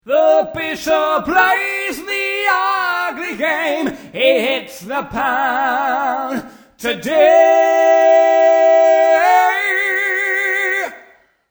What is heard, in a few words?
Harmony